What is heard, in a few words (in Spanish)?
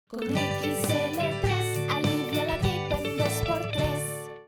JINGLE XL3 ALIVIA LA GRIPA EN UN DOS POR TRES